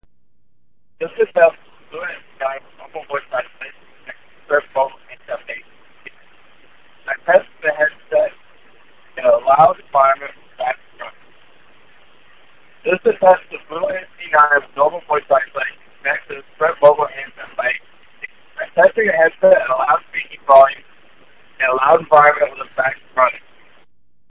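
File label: Vacuum, headset; BlueAntZ9 normal; Mogul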